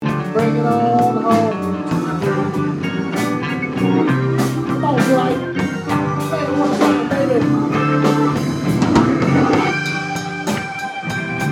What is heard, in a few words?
Live Band